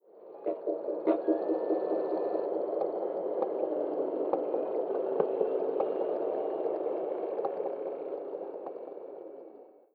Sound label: echo clicks; ambient soundscapes; dota